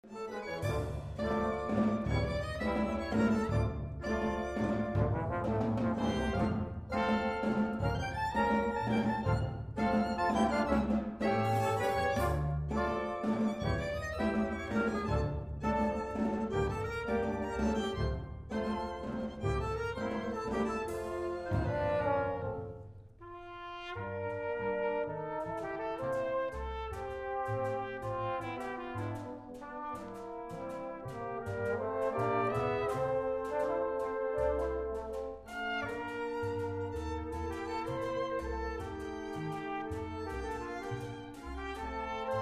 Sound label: orchestra performs tango